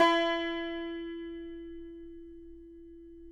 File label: CUMBUS E, Guitars; Spectrasonics; Hans Zimmer Guitars VOL-2